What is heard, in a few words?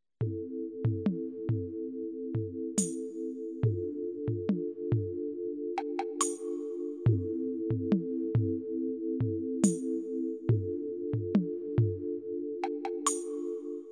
STRINGS